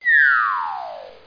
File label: lose; rott